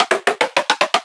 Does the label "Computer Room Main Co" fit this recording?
No